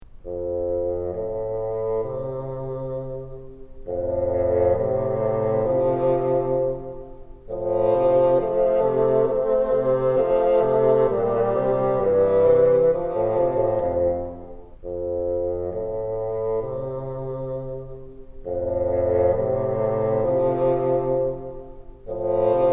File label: together, bassoon